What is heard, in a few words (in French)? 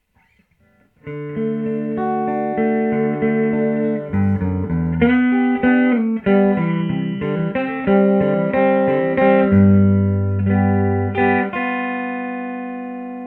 humb clean neck, guitare; HUMBUCKERS GNB